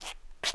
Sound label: krok soup; Mafie 1